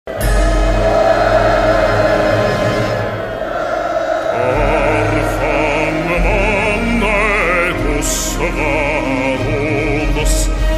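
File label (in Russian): гимн монголии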